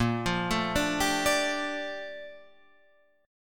A sharp Major 13th A sharp Guitar Open F; arpeggiated

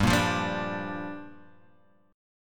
G Minor 7th; Gm7 strummed; JGuitar

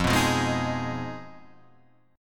G sharp Minor 6th F

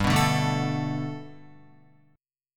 G Major Add 9th, Guitar; strummed; 4F,4A,5C,5G,5C,6E

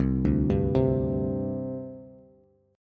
C Suspended 2nd, C, down, Bass; Csus2 arpeggiated

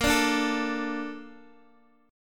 A sharp Major 7th, Guitar DADGAD

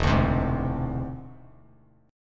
E Augmented 7th E down Bass Standard; E+7 strummed; JGuitar

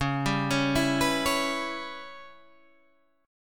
C sharp Minor 7th Flat 5th C sharp; C#m7b5; arpeggiated

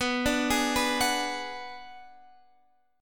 B-Minor 6th B down Guitar Standard; arpeggiated; capo 3

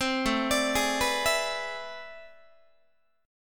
A-Minor Major 11th Guitar, AmM11 chord; arpeggiated; JGuitar

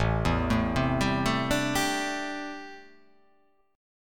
A Minor 11th A, Chord

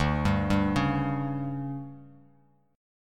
D Major 7th Suspended 4th, D, down, Bass Standard; DM7sus4; arpeggiated; JGuitar

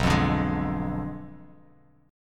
B Diminished 7th B down Bass Standard; Bdim7 strummed

